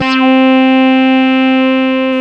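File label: synth Morpheus; Sound & Vision - Gigapack II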